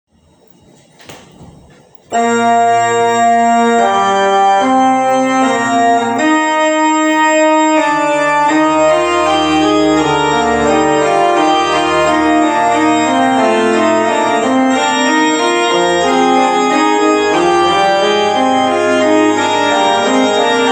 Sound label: Fugue in E flat; BWV 552 1st. section Bach